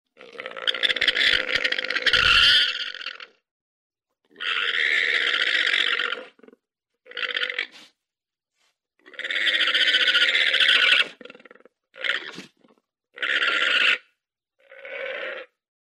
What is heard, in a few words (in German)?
lama; haus und nutztiere erkennen